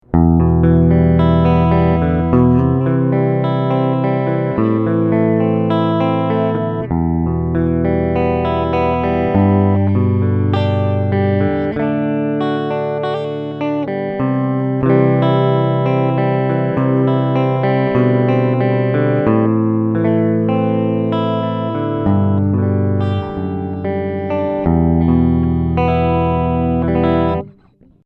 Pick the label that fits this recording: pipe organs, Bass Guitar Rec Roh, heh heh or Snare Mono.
Bass Guitar Rec Roh